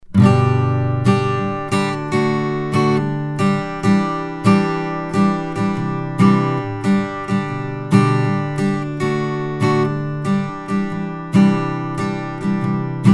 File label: Acoustic Guitar; mic shootout